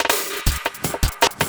LOOP SD, 160 BPM

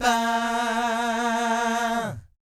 VIB FX, Jazz FX

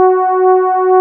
PD DARK, Nord, LEAD PAD HRN; Wizoo - Powered Nord

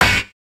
chords

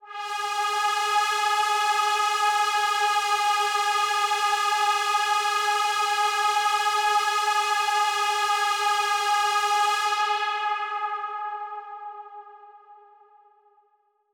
Big Pad G#3; KG MegaSaur

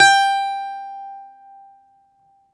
Balalaika, Prima Balalaika, Plucked Strings; E-MU Producer Series Vol. 7 – Old World Instruments

